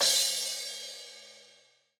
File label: Crash